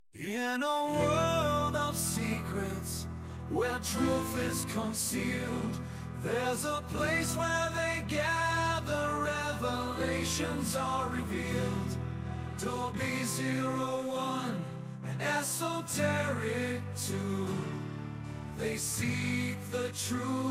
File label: OperaRock